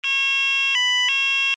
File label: alarm tone, sounder alarm tone; sounder beacon